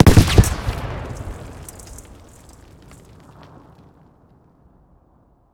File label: med close, explosions; gmod; lvs framework